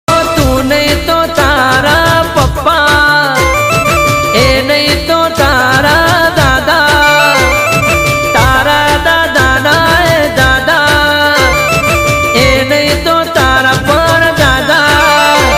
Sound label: Ringtone